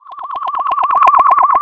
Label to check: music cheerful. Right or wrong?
Wrong